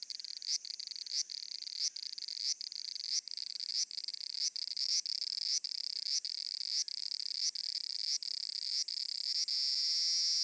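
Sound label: large brown cicada; aburazemi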